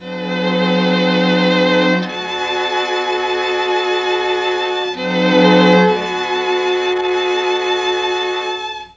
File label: F4, Mellotron; Mike Pinder - Mellotron Archive CD